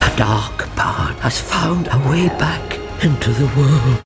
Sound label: trailer dark power; hobbit